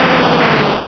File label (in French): Cri